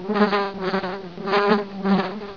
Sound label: fly; cstrike; knas Docks3